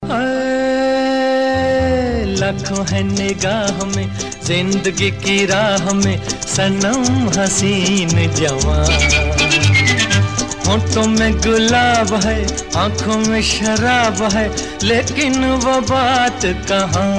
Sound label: bollywood remix instrumental